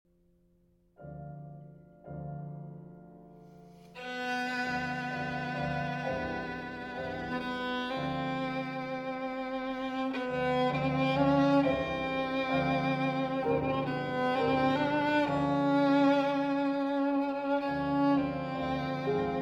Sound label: Violin and Piano Larghetto; Sonata No. 2 For Violin and Piano, Op. 111